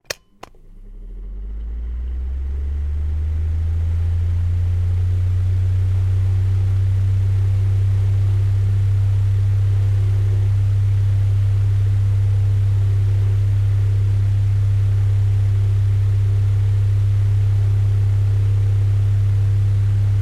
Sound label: fan desktop on run one min off